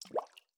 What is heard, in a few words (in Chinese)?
滴水石锥水滴入炼药锅声